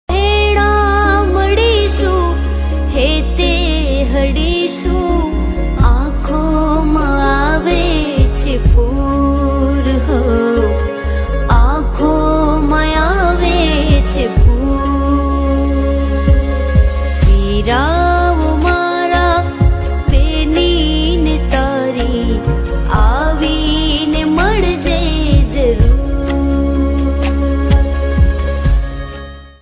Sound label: gujarati ringtone